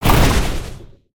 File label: Spinjitsu Ice Shuriken Project